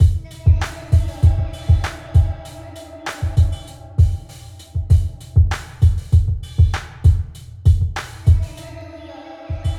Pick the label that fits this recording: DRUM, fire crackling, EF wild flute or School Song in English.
DRUM